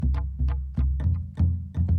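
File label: Calm Far, music; from dust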